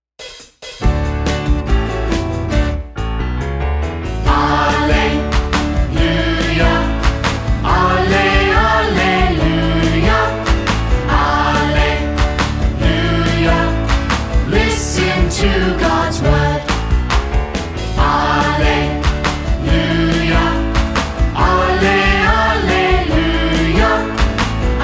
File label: Clap Alleluia; KidsLiturgy; Year A